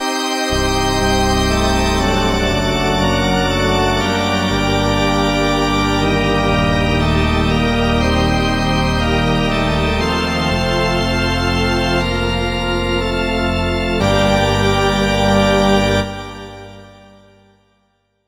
Church Organ; straightliner

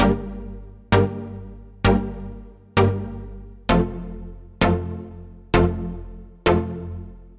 Thirds Stab Dry; echolab

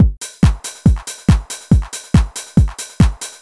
Four To The Floor Ping Pong; Plus X; echolab

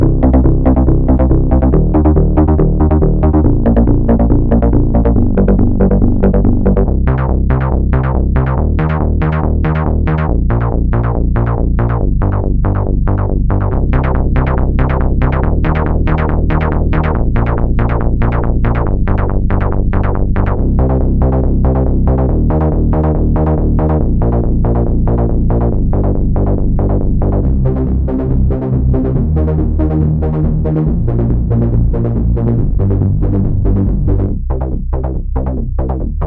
Various Basses; straightliner

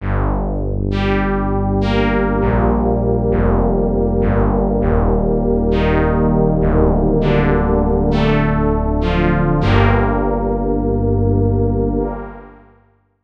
Analogish Pad; straightliner

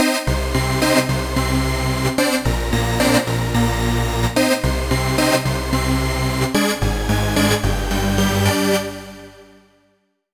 Mega Giga Tera Saw; straightliner

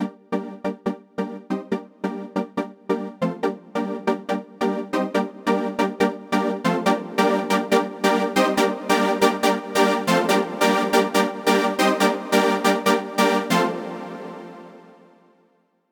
Trance Stabs; straightliner

